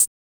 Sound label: Cl Hat, Roland MV8800; CR-7800